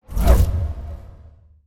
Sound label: torch by oneshot